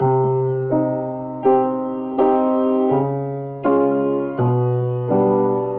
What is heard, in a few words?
piano somber, Sad; Loops